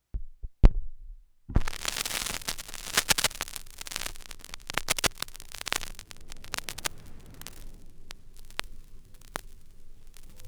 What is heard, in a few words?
I Should Clean My Records More Often, Record Fuzzies; Micro Pack